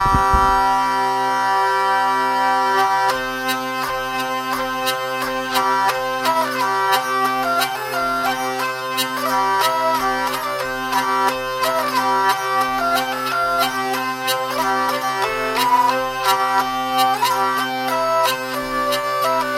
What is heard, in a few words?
gurdy vielle; scott